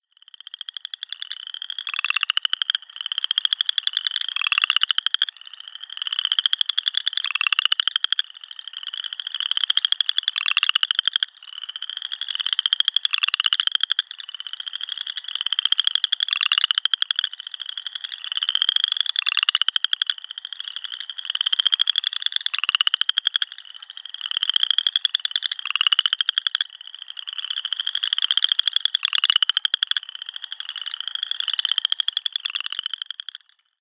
frog, Call a "thumbnail raking a plastic comb" (from low to high pitch); noticeably faster pulse rate; Pseudacris maculata